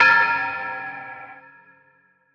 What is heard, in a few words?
percussion anvil; Percs; anvillain